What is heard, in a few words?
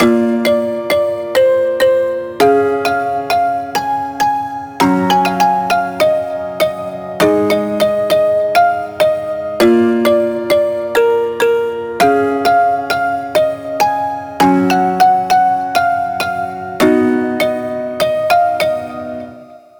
Catchy Melody Vibey 100BPM